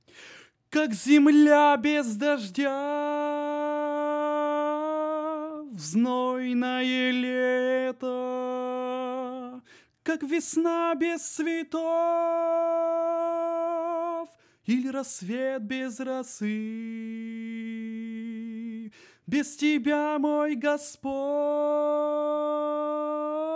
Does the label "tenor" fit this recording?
Yes